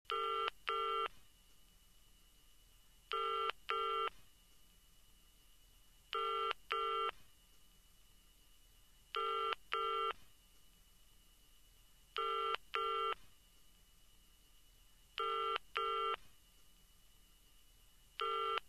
ringing sigh

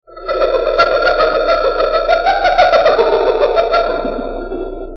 Hyena Laugh

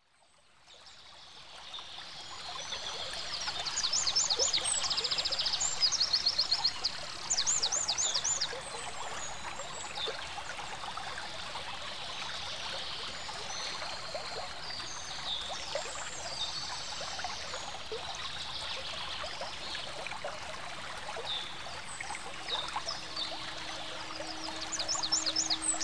Bell In the Sky; Ambience

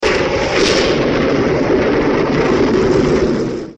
Thunderclap

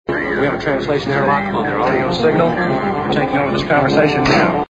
signal; close encounters

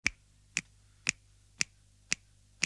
Finger Snaps

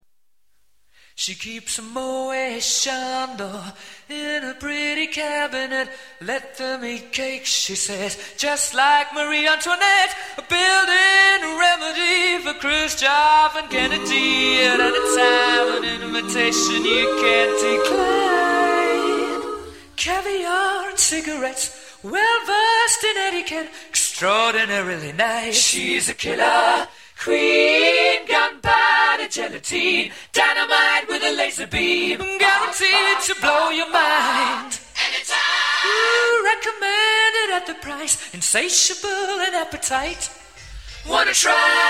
Acapella

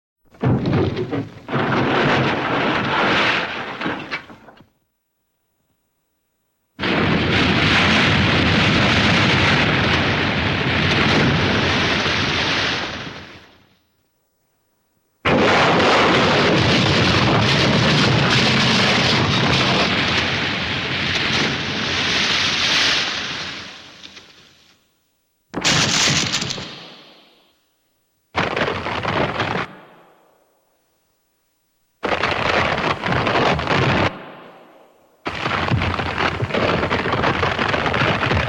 A Collection of Crashes, Halloween, Haunted House

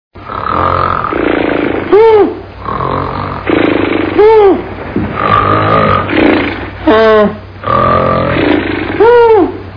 snore; Three Stooges

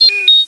talk; Star Wars; R2-D2

